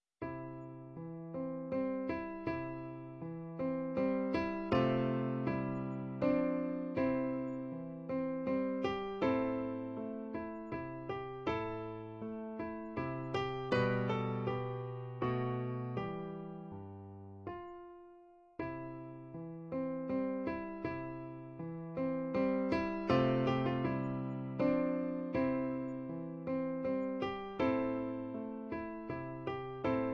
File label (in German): waltz, Klavier; brahms